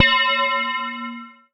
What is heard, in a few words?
BELL-GONG, A#; Big Fish Audio